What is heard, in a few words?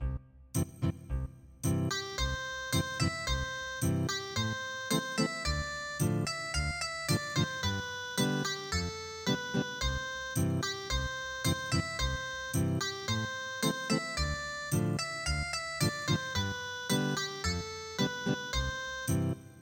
Ethnic Guitar